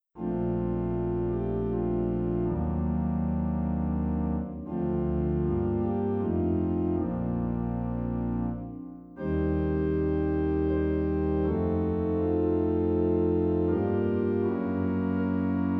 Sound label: Reharmonization "Silent Night"; STILLE NACHT; A delicious but tasteful reharmonization of Silent Night.